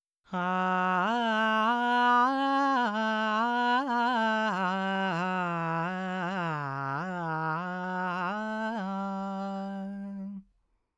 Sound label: Dry 4-4 G 100, Vocals Male